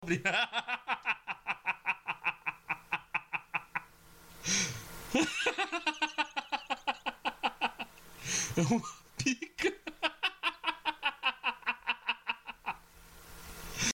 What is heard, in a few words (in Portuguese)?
risada